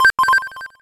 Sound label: COLLECT MEGA; impact